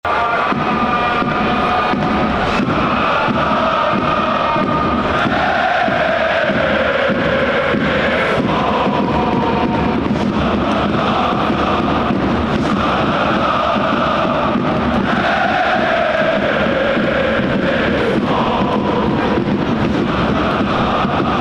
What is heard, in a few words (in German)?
Schalala Schalalla heeeyyy TSV